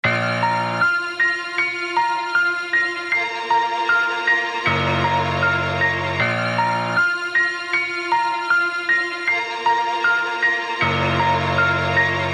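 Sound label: 78BPM A minor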